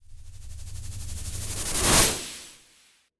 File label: stutter pass; sonniss gdc